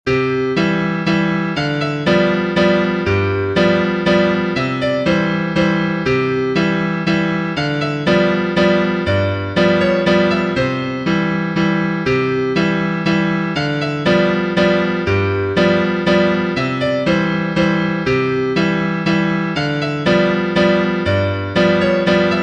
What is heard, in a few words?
Swedish Dance for piano